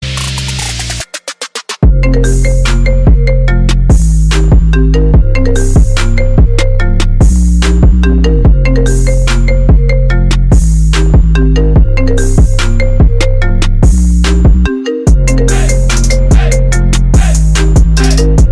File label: iphone remix